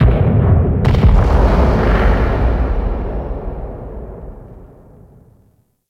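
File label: Ship Distant Damage Explosion; Warframe; Corpus Ship Remaster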